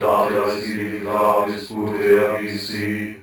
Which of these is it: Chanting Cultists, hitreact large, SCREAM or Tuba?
Chanting Cultists